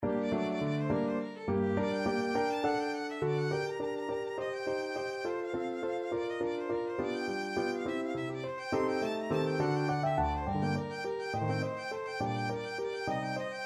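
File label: sonata g minor violin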